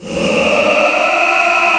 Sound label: man attack; delugeman; npc